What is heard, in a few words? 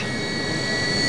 engine; 250 Desktop Themes